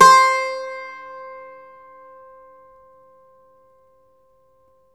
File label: Irish Bazouki; E-MU Producer Series Vol. 7 – Old World Instruments